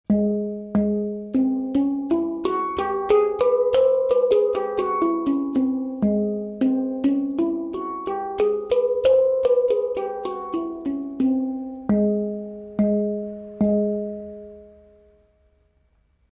Purvi, hang

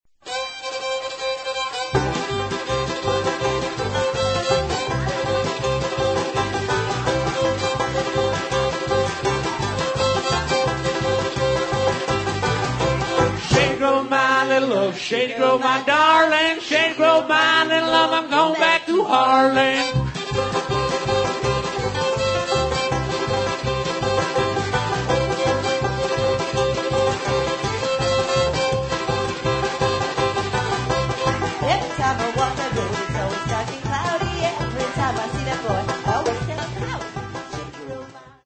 lumberhorn

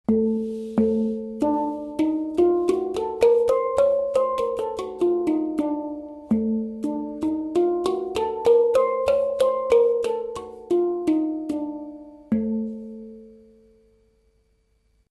Neveseri, hang

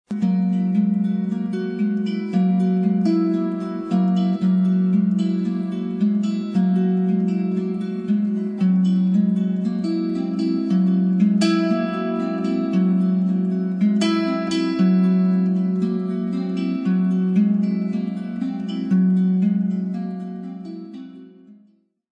celestial harp

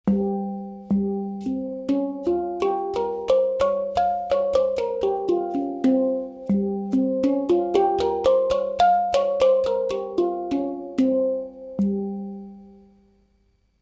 hang; Kokin-Choshi

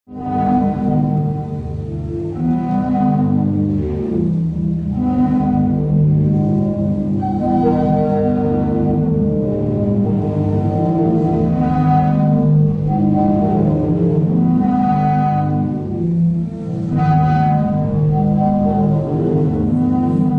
sea organ

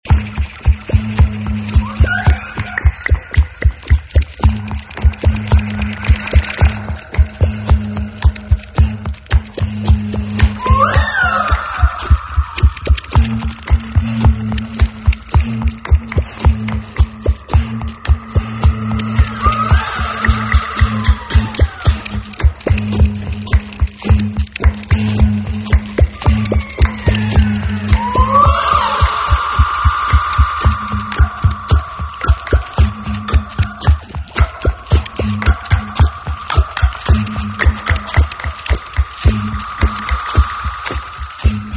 vegetable orchestra

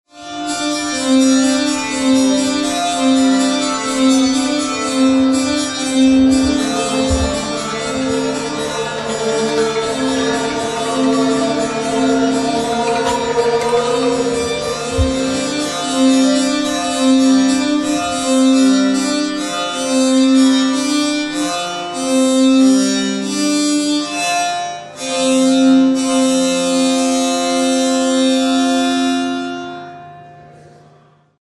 symphonic house

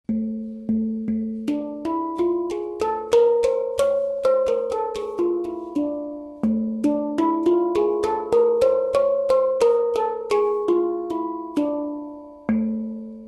hang; Sabah

